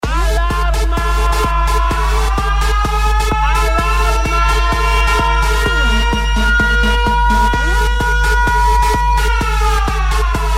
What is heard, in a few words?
Alarm Tone